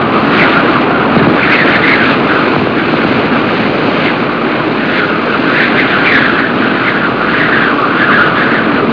uluyan rüzgar; doga

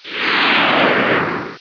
uçak geçiş; tasitlar

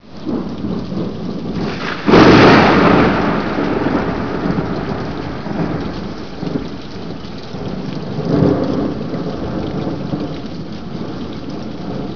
gök gürlemesi; doga

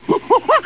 maymun; hayvanlar

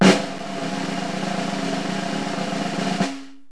trampet; ses efekt

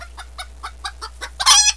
tavuk; hayvanlar

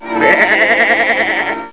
koyun mee, hayvanlar

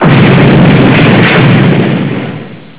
araba kaza; tasitlar

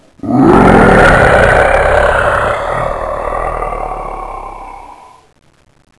kaplan kükreme; hayvanlar

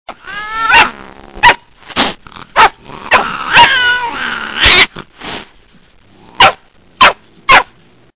kedi kavga; hayvanlar

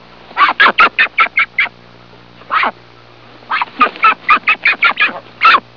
ördek uzgun; hayvanlar

